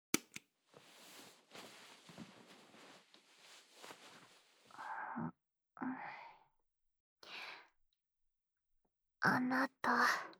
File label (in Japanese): 心音添い寝, ASMR